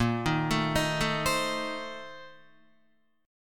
Bb Major 7th Suspended 2nd Suspended 4th Bb down Guitar, BbM7sus2sus4 chord; BbM7sus2sus4 arpeggiated; JGuitar